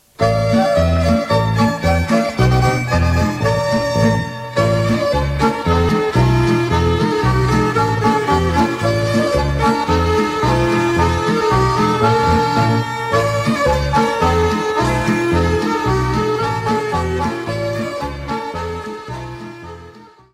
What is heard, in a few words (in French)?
POLKA - Danemark